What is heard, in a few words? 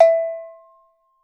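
LOW MELLOW AG, POP ROCK SET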